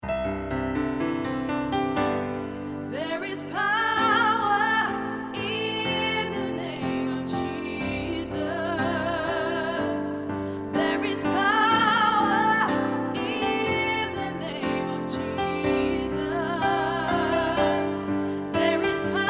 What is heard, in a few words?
sop, choir; Presence op het Malieveld